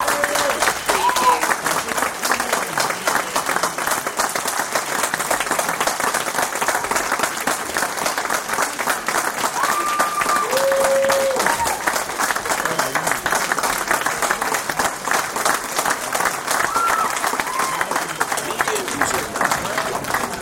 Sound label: Audience Applause; beanstalk audio